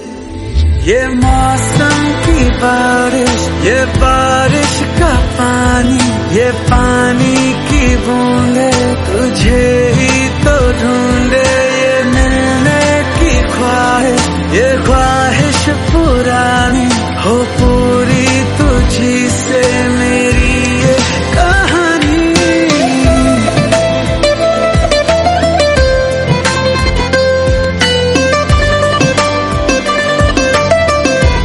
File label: new bollywood girl friend song